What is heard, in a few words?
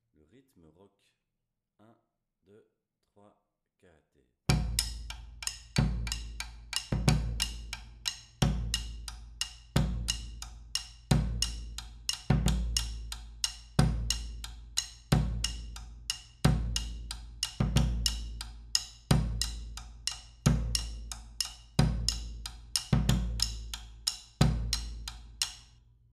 Rock Intro, Batucada